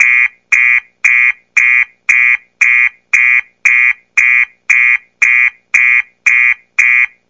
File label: car alarm